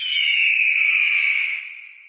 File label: eagle screech